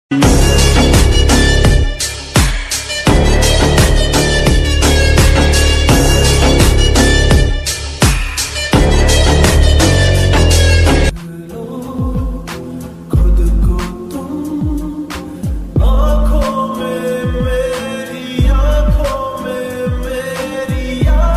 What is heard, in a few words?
lofi remix ringtone slowed and reverbed ringtone; axis ringtones